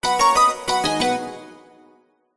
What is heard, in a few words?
multimedia alert notification bold strong musical positive